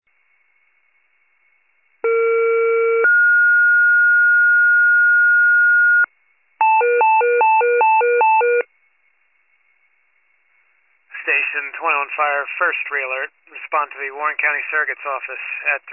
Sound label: fire, pager alert